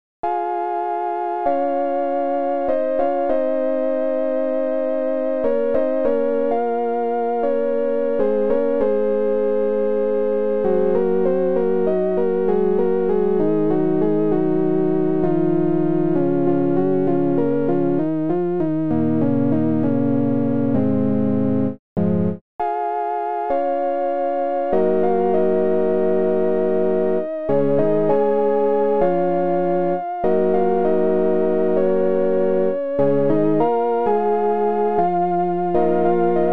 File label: Wurly Wurl; Volt